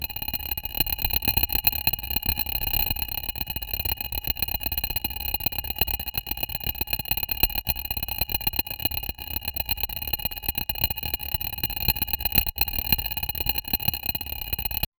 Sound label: retro rhythmic and quiet, relay